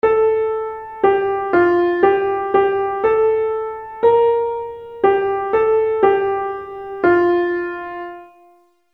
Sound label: harmonic melody